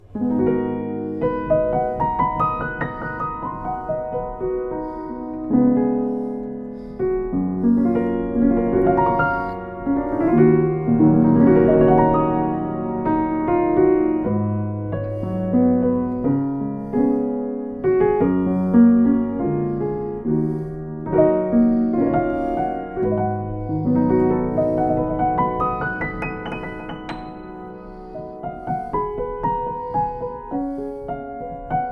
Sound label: Yamaha M5J, piano